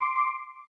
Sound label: NFC Error, LG Stylo 4 Plus ui